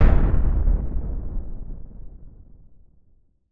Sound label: medium cinematic boom